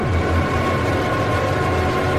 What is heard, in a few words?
jumpscare; undertale; chara